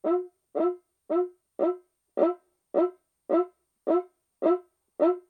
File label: Cuica